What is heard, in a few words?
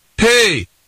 pain; freetv